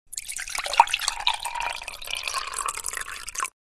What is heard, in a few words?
Liquid water pouring in a glass container